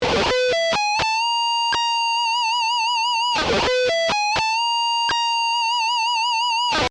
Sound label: Exotic Guitar Lick